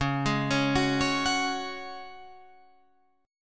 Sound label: Db Suspended 4th Db, Guitar, Drop D